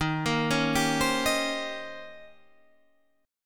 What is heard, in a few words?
Eb 13th, Guitar, Half Step Down